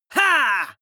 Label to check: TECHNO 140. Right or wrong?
Wrong